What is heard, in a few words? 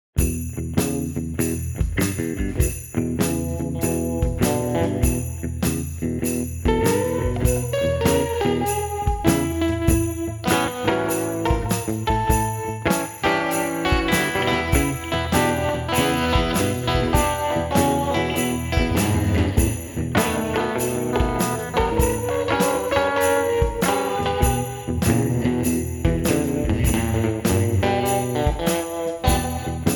jingle blues